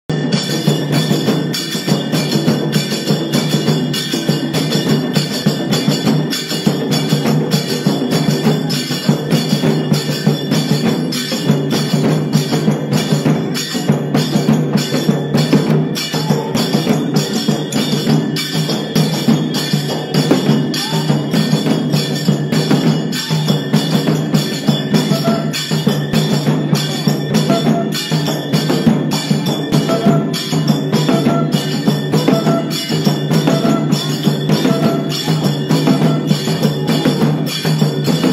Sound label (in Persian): Tabl Senj Moharram; بی کلام